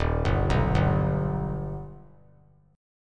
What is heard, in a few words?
Eb Augmented Eb; arpeggiated; JGuitar